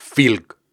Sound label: Syllables and Imaginary Words, Viking Vocal, Hard